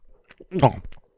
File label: Nom